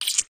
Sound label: alien, creature